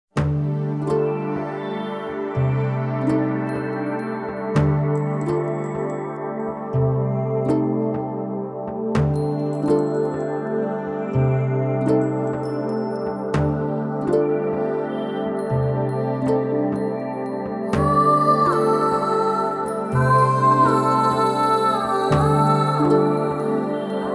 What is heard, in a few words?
waltz; Tsukuyomi MOON PHASE